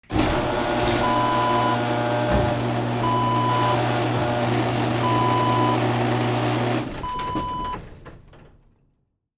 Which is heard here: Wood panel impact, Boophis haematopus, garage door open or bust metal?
garage door open